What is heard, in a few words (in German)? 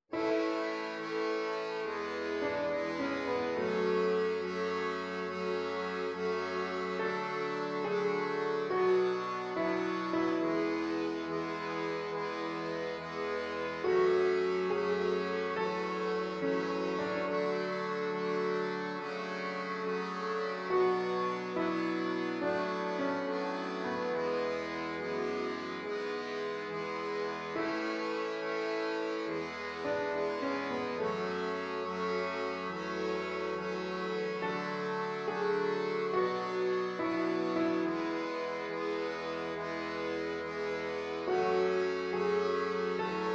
Ja ich fuehle Sinn Akkordeon